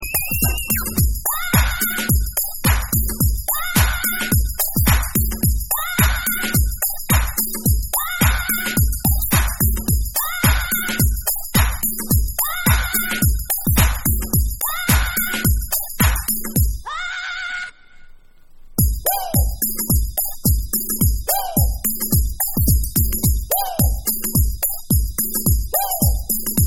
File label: DRUMS